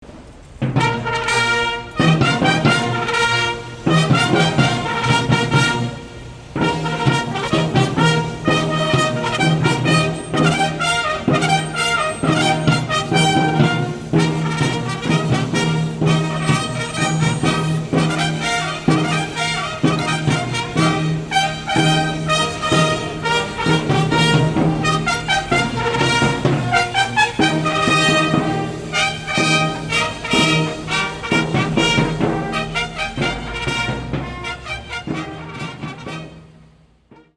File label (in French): la fanfare